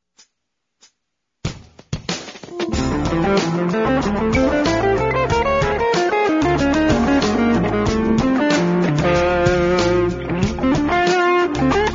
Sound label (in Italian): suonare outside, scale pentatoniche; Suonare outside con le scale pentatoniche!